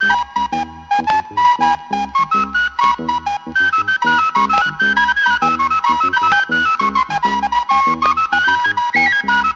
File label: flute keylike, Loops, Phonky